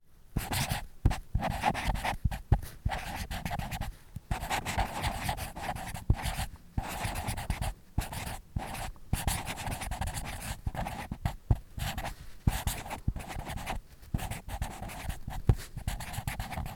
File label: Writing